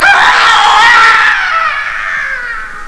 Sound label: scream Male